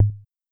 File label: Tom Hi; AJK Percusyn; Drums